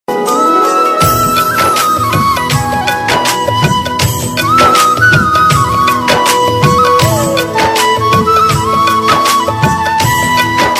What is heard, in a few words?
Instrumental (Music)